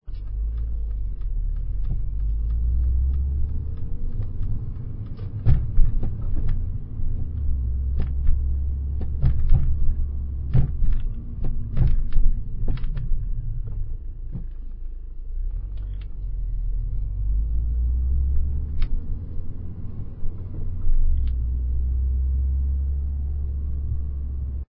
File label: troubleshooting suspension passenger side kick interior